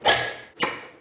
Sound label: Button